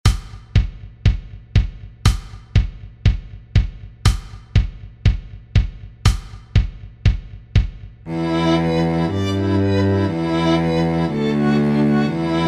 violin and cello; drowsy maggie

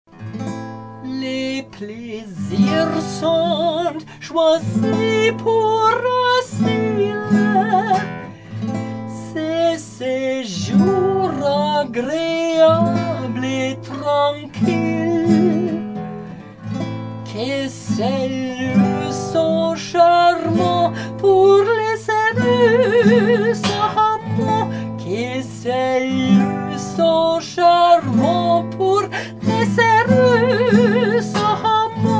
voc guit; Armide

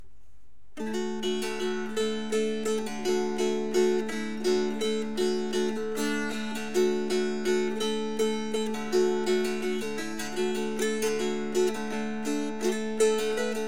dulcimer sample

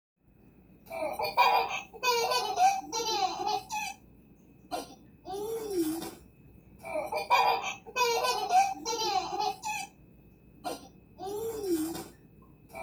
Laughing Loop; creative use of backing tracks

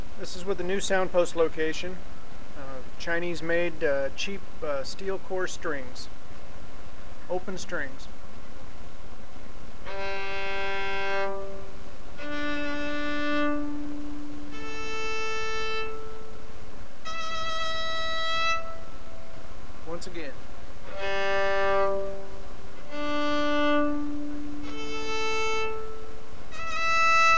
open strings new sound post bridge

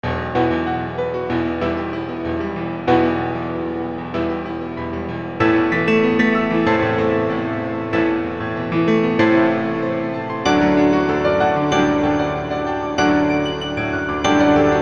Piano Em Am D Em

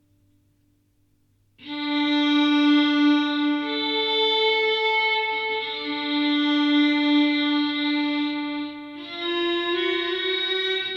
Violin practice; popped into the studio for a short practice and to have a go at making a recording

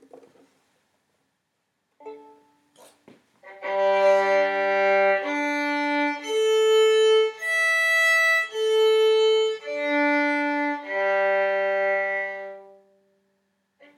strings

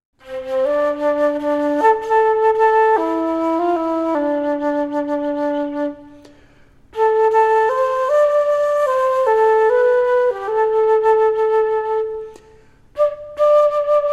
new flute sample

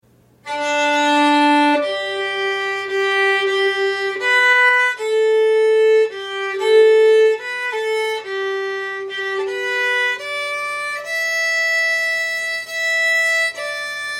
Auld Lang Syne Violin

